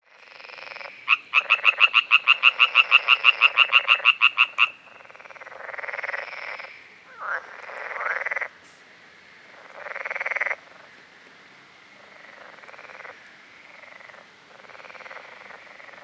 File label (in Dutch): geluid van de boomkikker